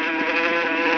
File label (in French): Mouche